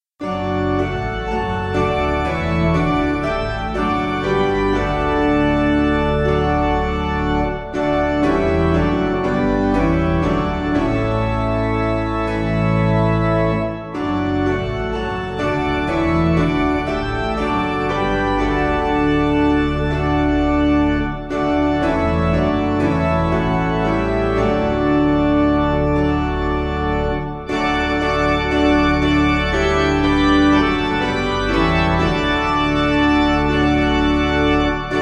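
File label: Piano, Music Backing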